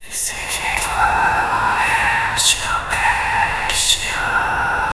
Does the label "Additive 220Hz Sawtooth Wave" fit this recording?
No